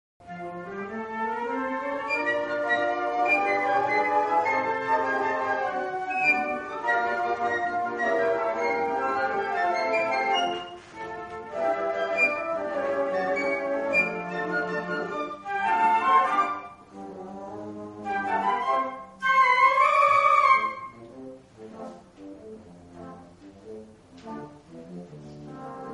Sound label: concert, flute